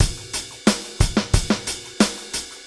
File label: drumloop, pop, 90